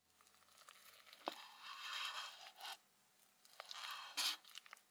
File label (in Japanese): 卵焼き を 切る 音